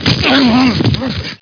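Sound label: TACKLE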